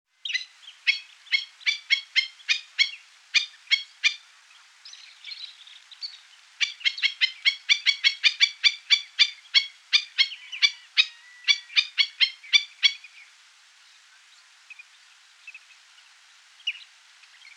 Echasse d Amerique Black Necked Stilt, chants des oiseaux; Guadeloupe

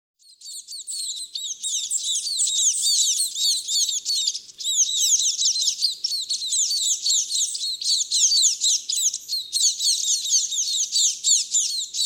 capucin damier Nutmeg Manikin Spice Finch, chants des oiseaux; Guadeloupe

